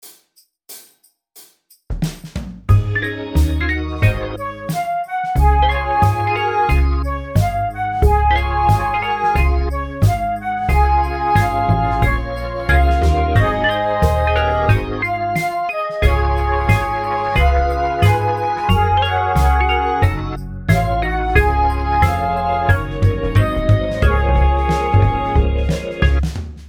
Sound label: When The Saints Go Marching In Flute